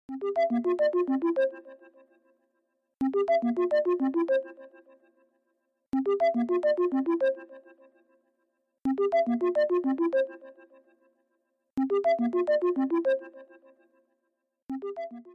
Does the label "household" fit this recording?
No